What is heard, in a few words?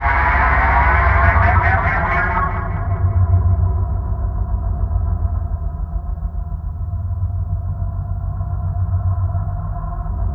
SCANNER; Best Service Advanced Media Trax Building Blocks; WAR GAMES